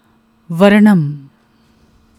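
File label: Varnam, Carnatic Music; Singing styles of classical music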